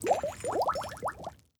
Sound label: tab ideas; europa universalis iv